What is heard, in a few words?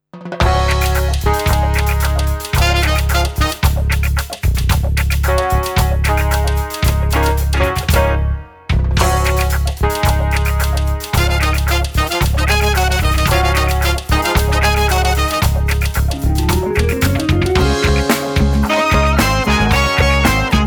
Warm Up Double; drumplayalongs